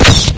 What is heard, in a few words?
ag fire; agrunt; video games; source engine; bshift